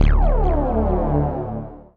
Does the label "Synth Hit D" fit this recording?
Yes